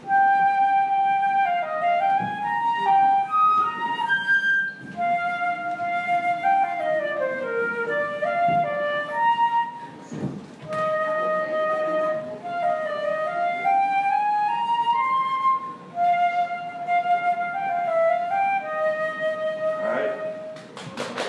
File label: flute; gakki